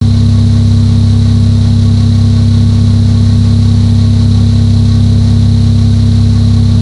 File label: microphone test all 3 wheels sync; test of the microphone already done during one of the integration tests in Florida